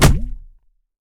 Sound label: hit trunk; smite; god ganesha skin11